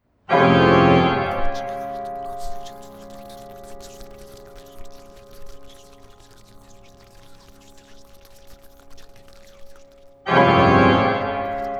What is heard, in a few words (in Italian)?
Organo Pf e Voce; Organo Pf riv e Voce